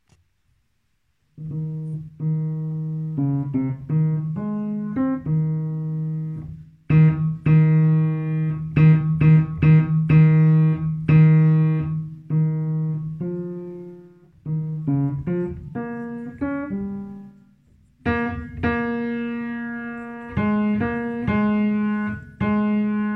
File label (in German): Bass; Alpsaege